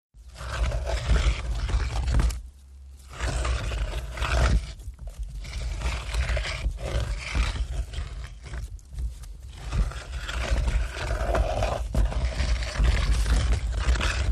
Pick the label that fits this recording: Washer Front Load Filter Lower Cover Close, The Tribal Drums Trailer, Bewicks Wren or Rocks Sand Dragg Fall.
Rocks Sand Dragg Fall